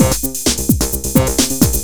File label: fast forward, drum loops, bpm130